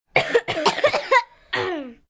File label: Group Cough, Coughing, Group, 2 children, clearing throat, short, kids; males, females, girls, boys, human voice; Sick